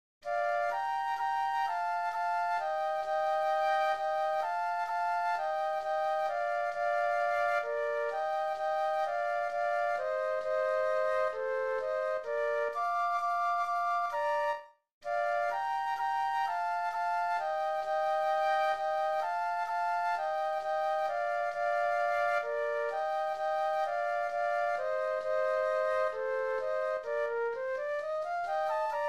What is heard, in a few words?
flute duet; classical